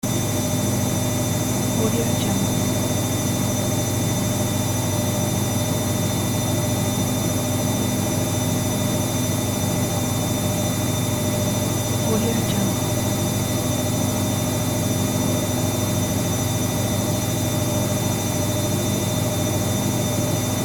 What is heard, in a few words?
spinning washing machine